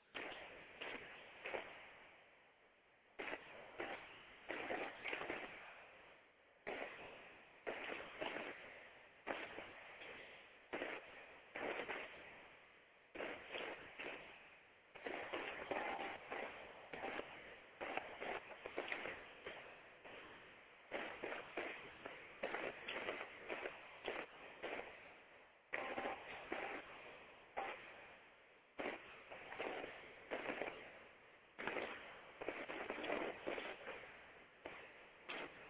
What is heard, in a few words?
Rain; downpour; intramural fields